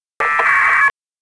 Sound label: digital squawk on simplex; radio; foxhunting; stuck transmitters